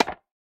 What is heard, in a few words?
Racket Weapon